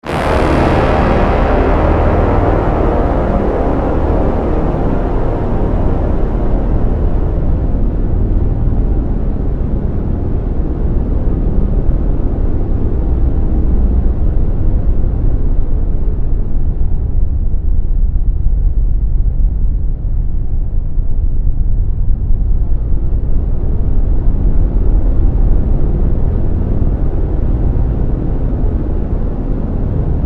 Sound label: Drone Synth Stab, Drone, Synth, Stab, Long, Horror; Descend, Ascend; 5.1; BlastWave